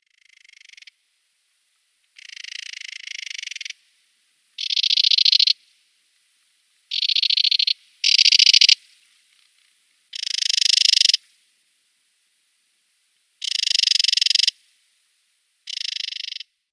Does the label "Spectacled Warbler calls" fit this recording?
Yes